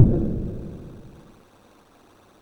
Taipan sfx, MOS6581R4

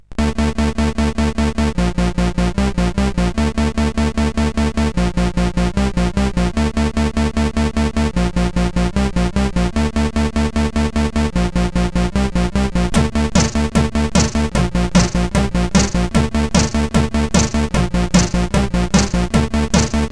CSG8580; Square Scape 2

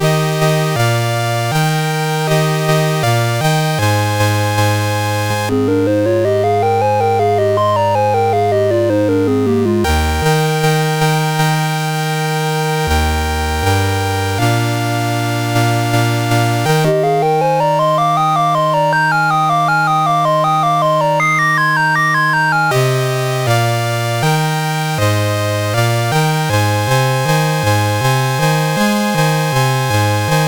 1812 Overture, CSG8580R5

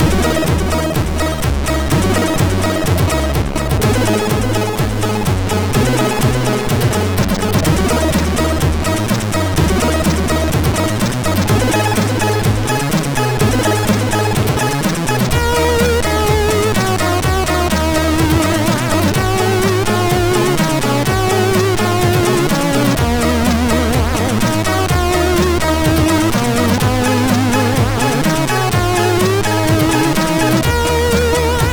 sid MOS6581R2; Square Song 006

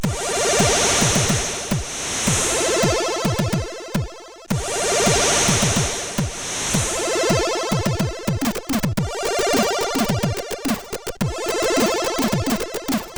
Shuffle, CSG8580R5

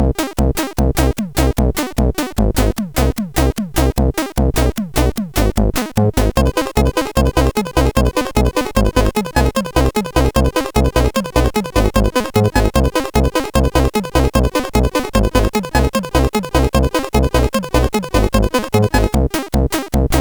Loading Music, CSG8580R5; hvsc

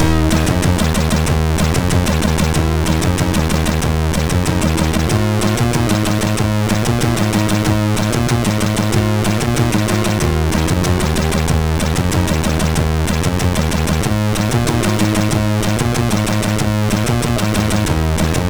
tune, MOS6581R2; sid; Funmuzax 1 tune 5

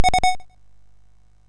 Dragons Den, MOS6581R3

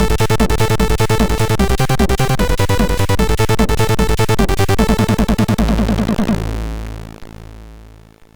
Trance, MOS6581R4; Trance Sector Ultimate

